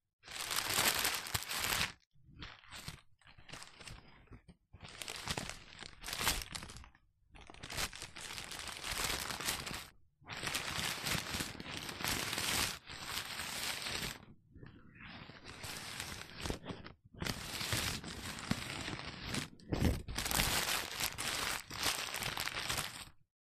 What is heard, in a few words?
read the paper